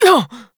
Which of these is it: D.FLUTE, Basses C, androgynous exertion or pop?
androgynous exertion